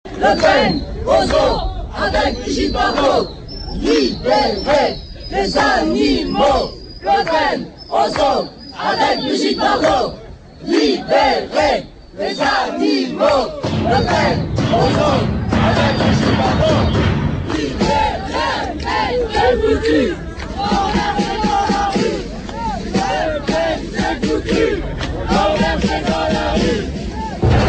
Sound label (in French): Manif Anti FN ambiance